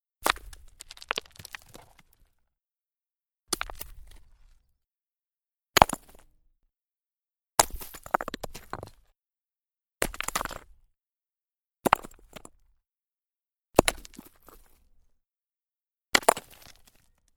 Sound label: Small Rock Impact Tumble And Settle; A fist sized rock drops onto other rocks and settles. 8 takes